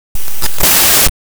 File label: glitch